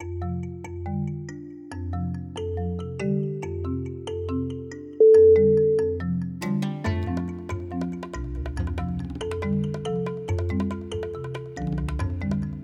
light tribal dance

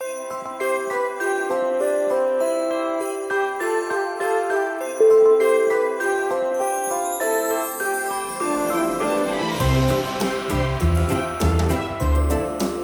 winter jazzy

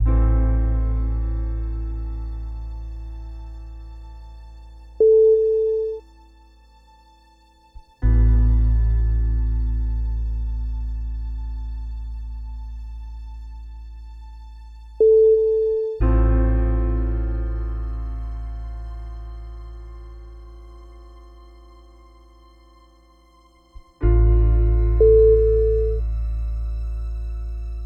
slow drama sadness

